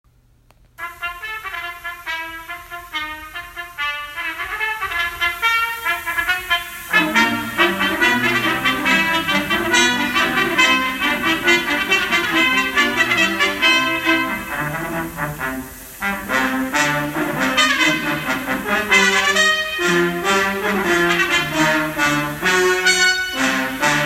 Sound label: trumpet solo high school; Band